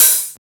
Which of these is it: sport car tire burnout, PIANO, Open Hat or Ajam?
Open Hat